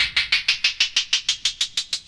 Transition; fl studio; drumkits; dstarkels plugnb; Fx

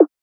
Snare

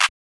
clap; maily plugnb; fl studio; drumkits

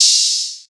Open Hat, Cymbals; drumkits; fl studio; bvker drumkit

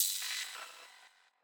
oh; drumkits; human; fl studio; goodtakimu drumkit

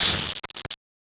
perc; goodtakimu drumkit; fl studio; drumkits

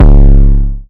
808 (C#); drumkits; fl studio; bvker drumkit; Born 808

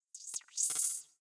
hh roll fx; goodtakimu drumkit; fl studio; drumkits

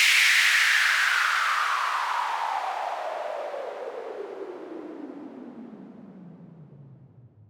white noise; FL Studio; slayerx drumkit

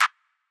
clap; fl studio; maily plugnb

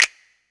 Snap, Klaps; drumkits; fl studio; dstarkels plugnb

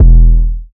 808 (E), 808s; drumkits; fl studio; bvker drumkit; Born 808

